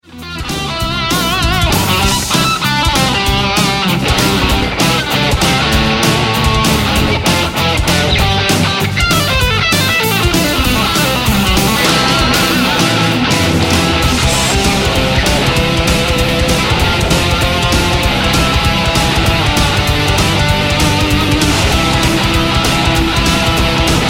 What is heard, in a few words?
Jingle Bells